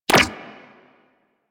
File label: Shield Impact; Warframe; Volt